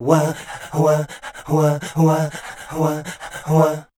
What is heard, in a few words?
SCAT, Voice Spectral